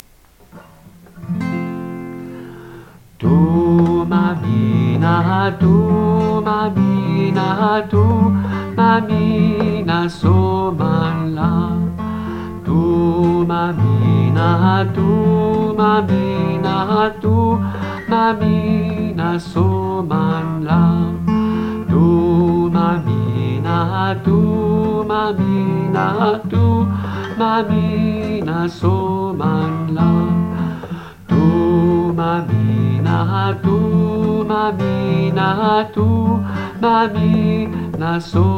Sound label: sopranos, chorale; Thuma mina